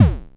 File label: Blippy Kick, Lo Fi Drum; Kicks